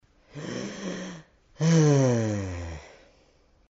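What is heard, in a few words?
male sigh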